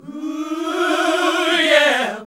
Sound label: HAL GOSP. MIXED; Sound & Vision - Gigapack II CD 1 (Roland)